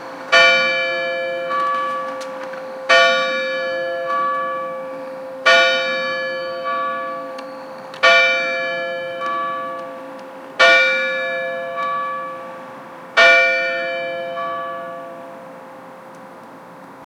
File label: church bell rings five